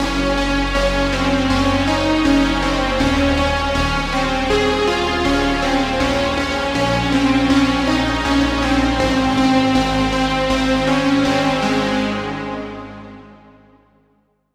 Key Fade Out; Routed Sound; Vital Expansion - Reality Maker Vol.1

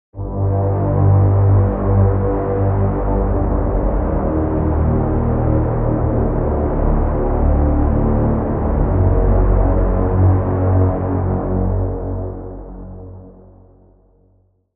pad; Magenta Parallel; Routed Sound; Vital Expansion - Doom Carpenter Vol.1

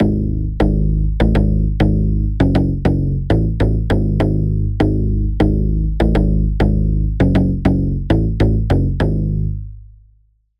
Bass; Bad Bone; Routed Sound; Spire Expansion - Noble Gangster Vol.1

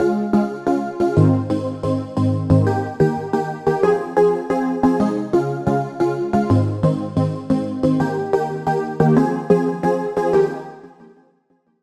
Pluck; Routed Sound; Spire Expansion - Star Scavenger Vol.1

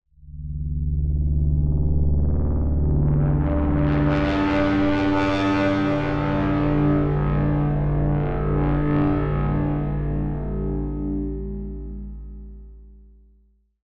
Pad, Sylenth1; Routed Sound; Sylenth1 Expansion - Quantum Enforcer Vol.1

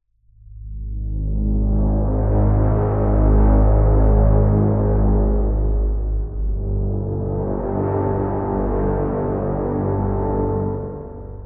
Pad, Sylenth1; Routed Sound; Sylenth1 Expansion - Quantum Enforcer Vol.1

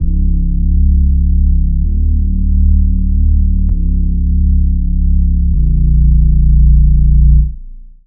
Reese

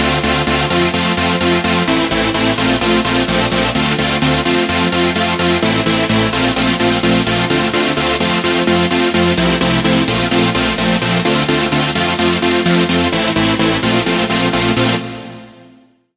chord; Origin; Routed Sound; Serum 2 Expansion - Flame Summoner Vol.1

